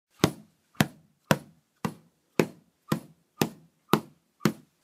Club; چماق

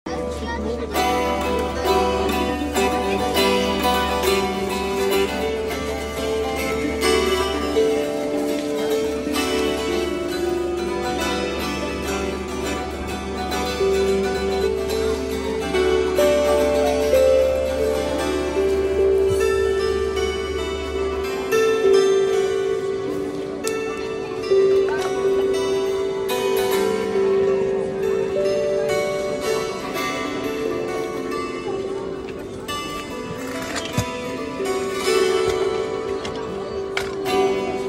Gusli

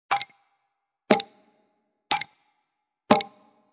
Pendulum Clock; پاندول ساعت

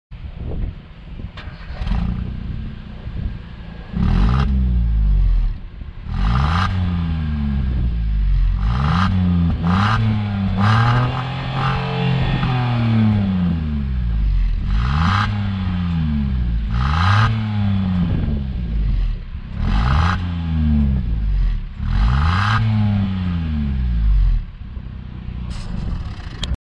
Datsun GO; ماشین

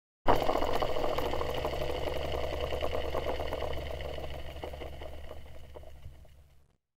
Luggage wheels; چرخ های چمدان

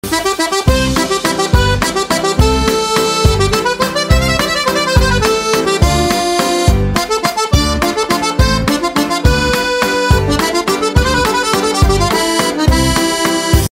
Accordion; آکاردئون

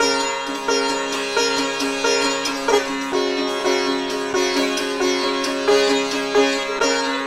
Sitar

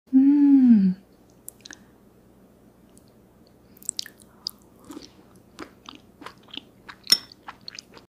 eat soup

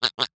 cartoon duck quack

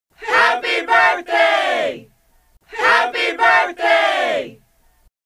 Birthday commotion; هیاهوی تولد

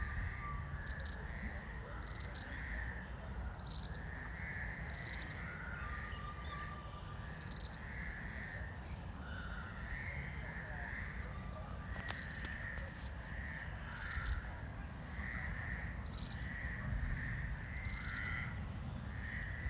Pericrocotus divaricatus

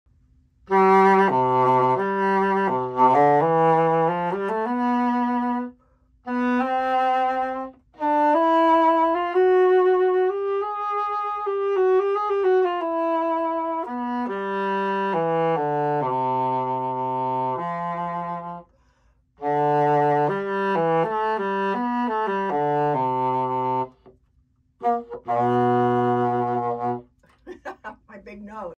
Heckelphone

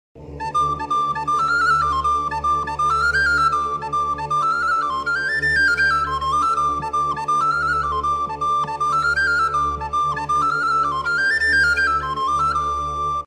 Irish flute; فلوت ایرلندی

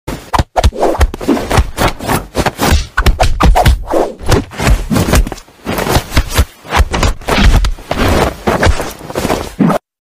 Kung Fu; حرکات کونگ فو

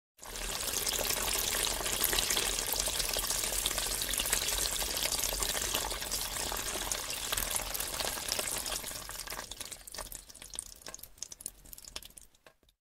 Water in pipe; آب در حال حرکت در لوله

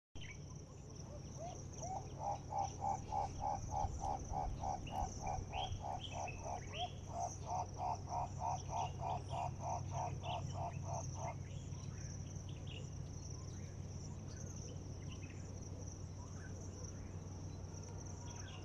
Guinea turaco

